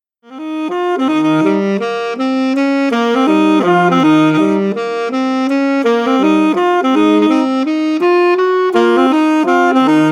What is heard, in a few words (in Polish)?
Saksofon